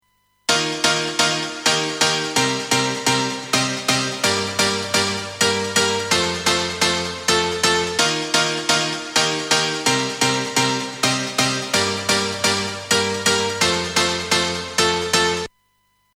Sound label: Piano Original